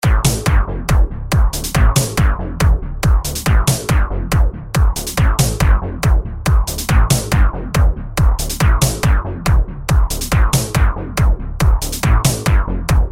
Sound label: Dark Trap Synth